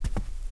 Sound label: player carpet; Group Mapping Project